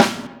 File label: snare 1; drums; virtual drum sounds